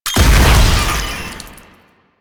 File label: Proto Infested Maggot Explosion